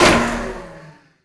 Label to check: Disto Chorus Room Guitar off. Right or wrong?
Wrong